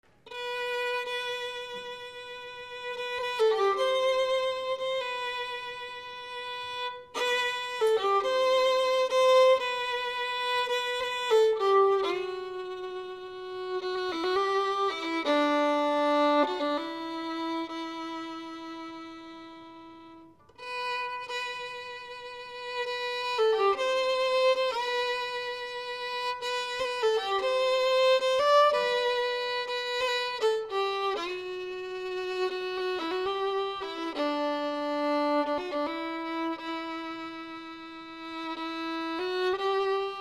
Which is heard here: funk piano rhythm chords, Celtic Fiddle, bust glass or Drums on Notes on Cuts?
Celtic Fiddle